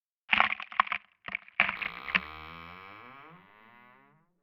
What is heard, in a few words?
Tree Falling